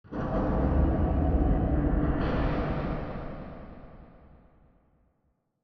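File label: Robot Arm Tilt Up Distant; Boomtown; Titanfall 2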